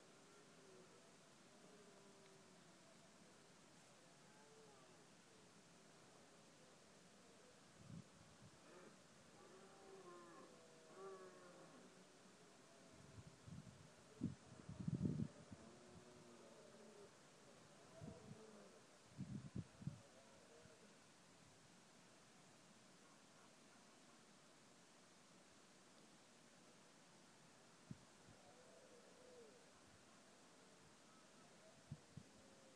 stags in the distance

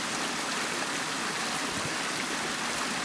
burn

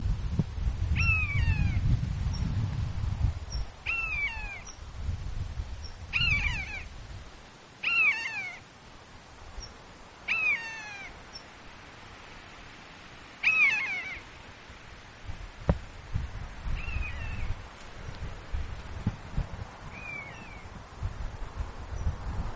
buzzard

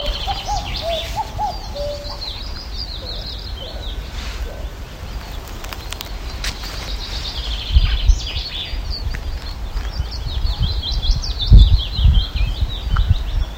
birdsong

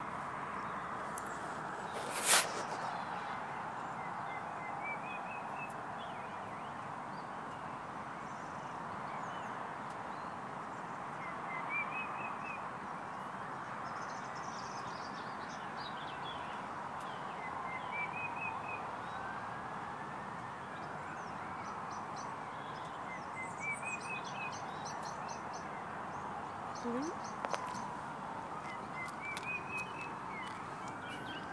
traffic birdsong

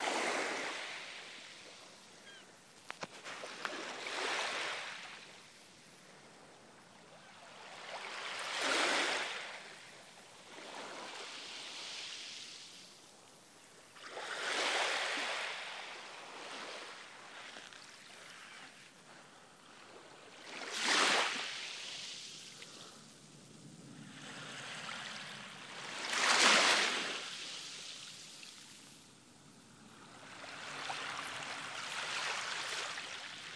beach; irvine

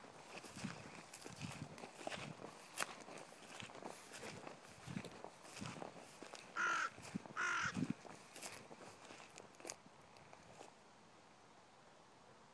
lone crow

